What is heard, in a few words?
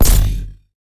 laser gun